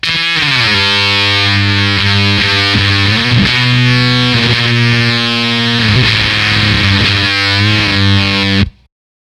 gtr, Fuzz test; frenchtoast

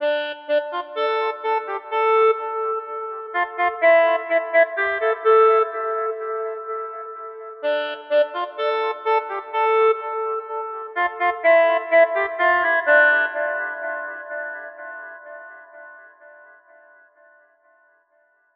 melodica loop; etheral melodica; soundfingers

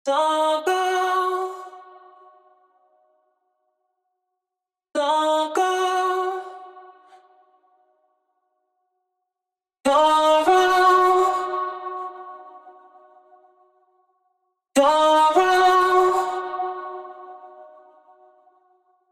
vocals; phat vst by aldenbraverman

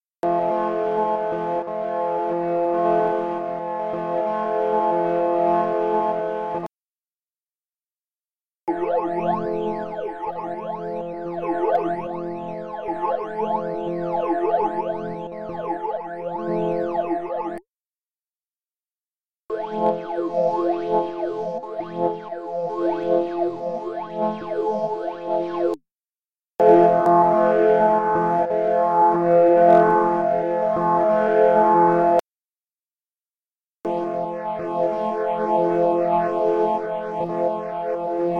phasers

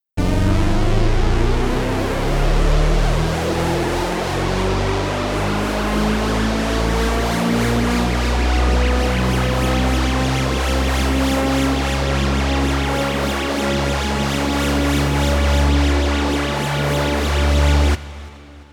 fx flight; the transmitter soundset

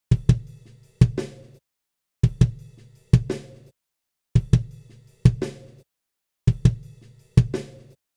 snare cymbal bleed; e expander600

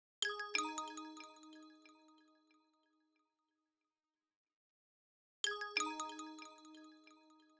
bells; mobbin; player vst; sound selection kits

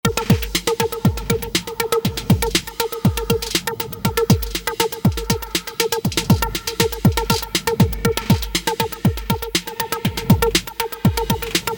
drums

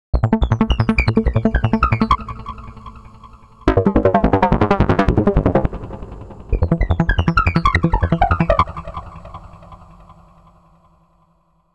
step seq 3 steps hold; the meditation soundset

